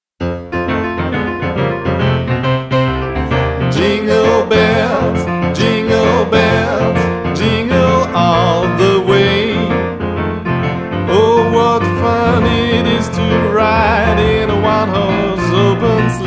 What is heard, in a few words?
sax jingle bells; boogie